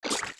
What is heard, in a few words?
Steps Deep Water Jump; Zelda; OOT